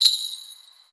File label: victory; chess